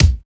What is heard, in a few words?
POINTED KIK; Drums In Your FACE!